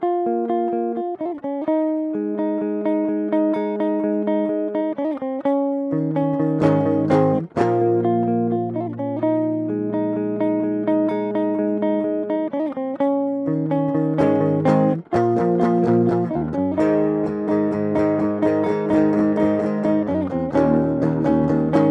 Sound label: clean